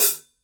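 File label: signature 14 sound edge hi-hat closed, cymbales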